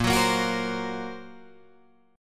A sharp Suspended 2nd Sharp 5th A sharp, Guitar Drop D